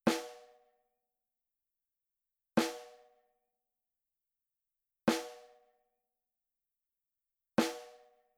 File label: SNARE Hybrid Edge Soft